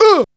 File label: UUH SHOUT; Back In Time Records; Big Bang; THE HIP